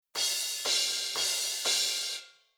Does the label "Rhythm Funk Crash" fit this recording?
Yes